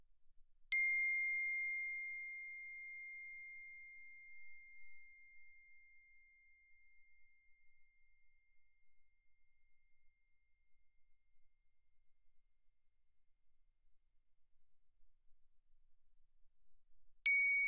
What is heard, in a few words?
bells, plastic, ff, C7 B7; Percussion